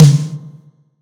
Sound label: acoustic drums